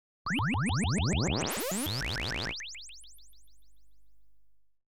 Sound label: Space Fantasy, Laser Gun; BBC Sound Effects Library